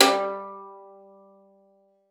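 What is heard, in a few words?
violin pizzicato G5; Xsample VOL-5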